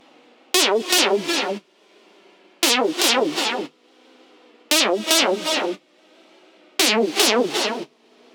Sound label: 115 Perc Injections; Zero-G; Future Wave